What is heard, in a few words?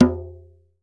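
earth tone, African set percussion; Voice Crystal - Steve Reid Definitive Percussion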